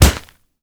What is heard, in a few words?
punch grit wet impact; Infernal Radiation